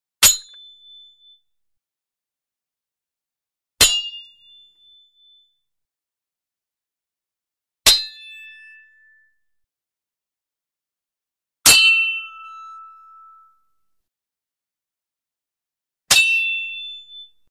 Sword Clanks Light Single Light Sword Clank, Metal; Hollywood Edge Foley Sound Library